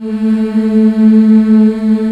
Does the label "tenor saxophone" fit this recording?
No